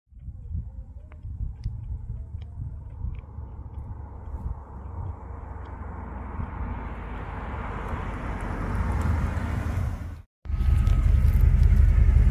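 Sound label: Pontiac, exhaust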